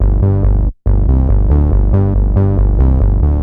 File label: Bass D; Techno; TechnoMusicMaker